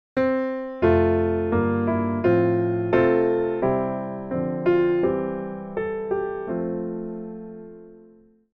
walkups, piano; auld lang syne

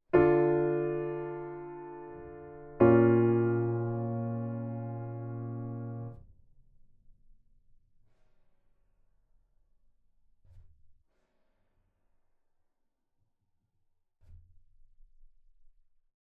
triad

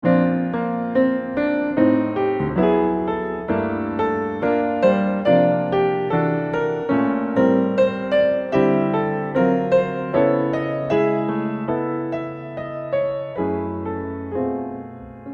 secondary dom seq; piano

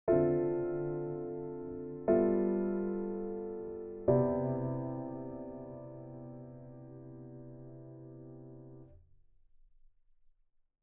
basic ii V I, piano

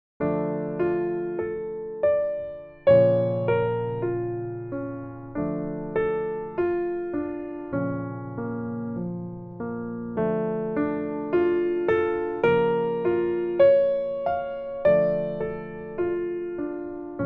arpeggios combined

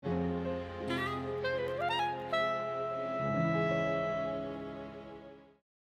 motif; film noir score

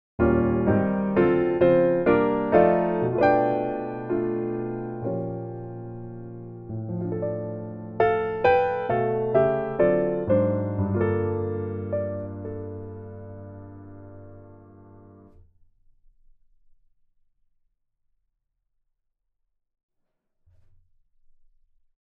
sentimental reharm; piano

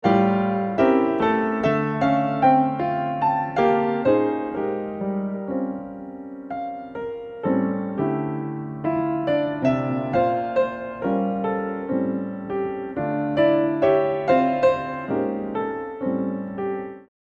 rhytmic seq; piano

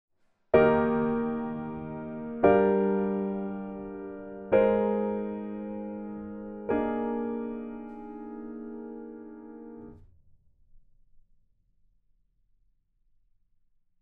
diatonic composition

